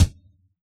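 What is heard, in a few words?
Analog snare drum, Yamaha DJX; 155 DJX Kit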